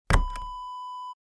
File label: stapler press